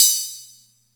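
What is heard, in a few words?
Atlantex Music Percussion Computer hi-hat open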